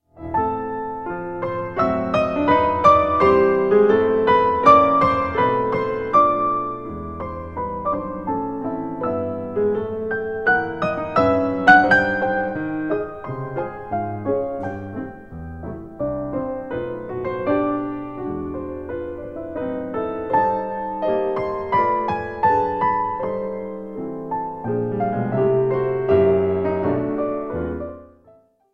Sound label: piano 2 pianos; Percy Grainger; Spoon River